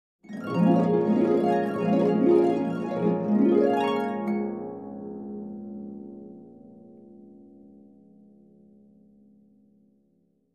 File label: Dreaming harp sound effect